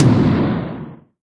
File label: T-TOM, PERC; NorthStar - The Wizard VOL-2; TSAR PERC